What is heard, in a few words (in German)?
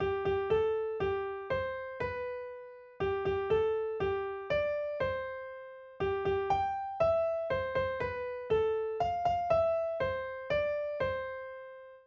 happy melodie